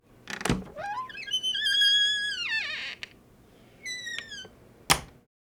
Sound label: Squeeky Door Household